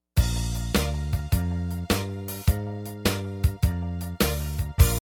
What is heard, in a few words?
Sample Bass Lick from the 50s